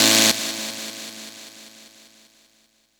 alarm; Ueberschall; Techno Trance Essentials

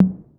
Miami Tom, drum machine; AcidLab Miami

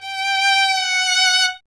STR G 5, Solo Instruments Vln; Miroslav Vitous

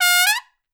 Trumpet Noise

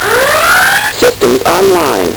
Nintendo Gameboy LSDJ

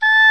Oboe f; Roland L-CDX-03 Disk 1; Oboe 4-8

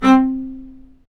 STR, Vc Marcato; Roland L-CD702; Vc Marc&Harm; Vc Marcato 1

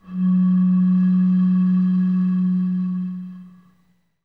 PRC BOTTLE, Bottle Blws; Roland - LA Composer vol. 2

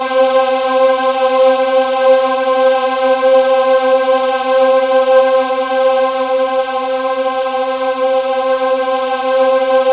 C5, Synth Line; 90 s Sample CDs; NiceBeats; SynthLine VOL-30

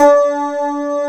STRAT G4, guit; East West; Global Sounds; VFX GUIT

